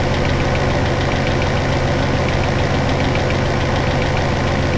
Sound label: mech; ambience